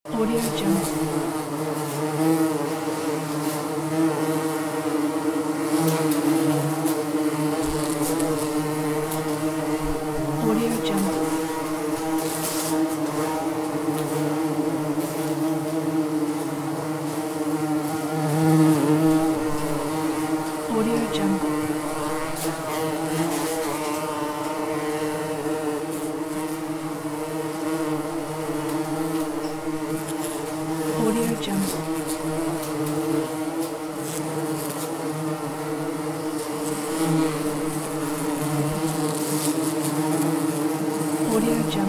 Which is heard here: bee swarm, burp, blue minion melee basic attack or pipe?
bee swarm